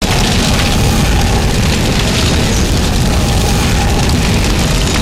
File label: gunstand flamethrower fire loop, turret; gow; turret judgement